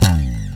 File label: Bass Slide; Digital Sound Factory Xtreme Lead